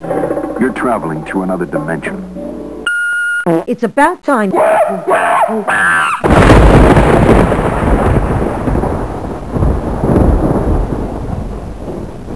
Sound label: Oh My BOOM